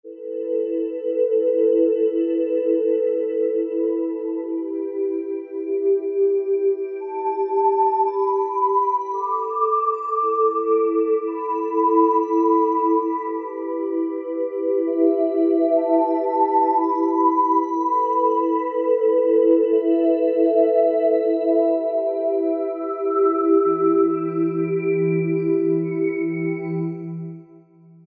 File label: Spun Glass; Sonic Reality Modern Songwriter